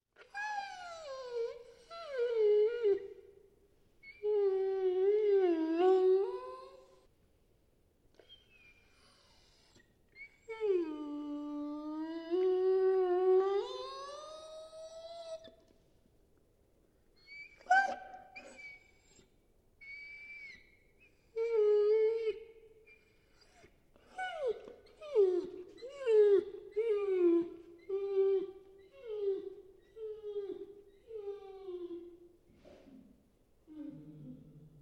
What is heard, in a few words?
Puppy Dog Crying Whining; Dogs; Animals; Tom Hutchings